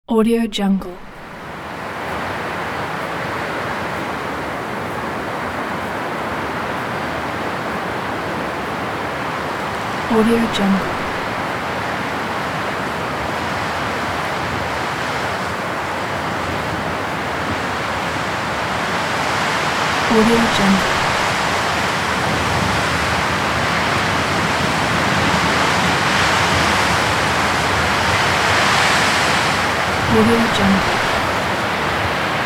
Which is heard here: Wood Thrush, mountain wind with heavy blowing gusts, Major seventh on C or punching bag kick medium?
mountain wind with heavy blowing gusts